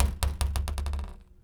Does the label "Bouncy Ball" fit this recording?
Yes